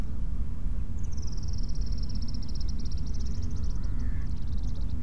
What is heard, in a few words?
Descending trills of a Rufous fronted Prinia, Rajasthan; Sutirtha Lahiri and AK